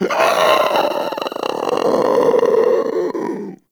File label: Infested Runner Death; Warframe; Runners